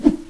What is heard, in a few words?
cbar miss, weapons